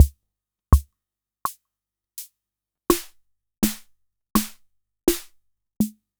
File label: Casio CT-403